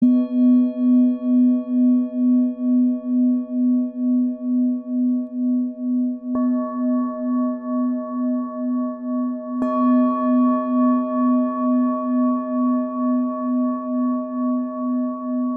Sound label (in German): klangschale titan